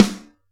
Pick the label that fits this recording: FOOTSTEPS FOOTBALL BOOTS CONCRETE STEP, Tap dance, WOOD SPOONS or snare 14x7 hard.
snare 14x7 hard